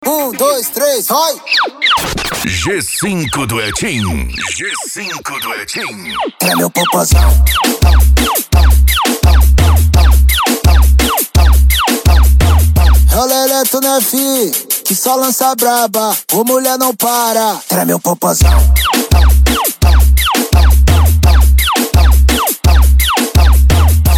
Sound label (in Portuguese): mega funk e funk mega bass